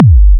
Kick One Shot, Drums

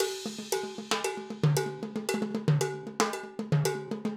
Acoustic Percussion

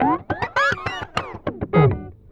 Processed Guitar, Loops; Guitars

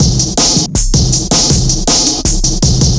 Old School Break, Drums

